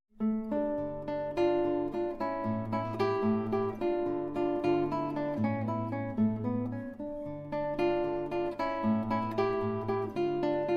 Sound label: allegretto, guitar